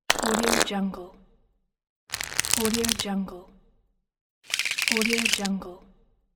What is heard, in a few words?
deck of cards